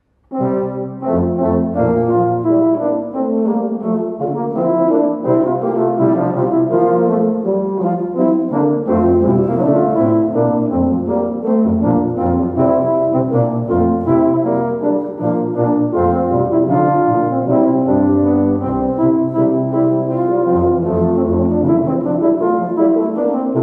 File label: Low Brass; Clement Janequin; Ce Moys De May; chansons; low brass quartet; early music series